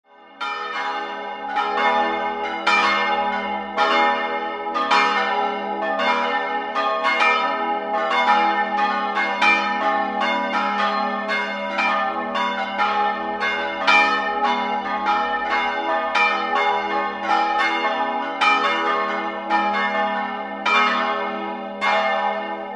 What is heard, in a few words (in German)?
Moersdorf, Glocken